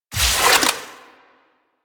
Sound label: Shotgun Fire Stop Mech F; Weapons; Warframe; Tenno; Alchemist